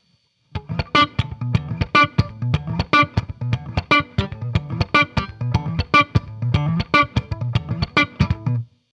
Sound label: slap